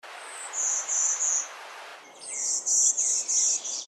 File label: warbler golden winged